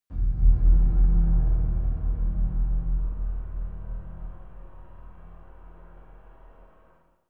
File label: scary metal chime; impacts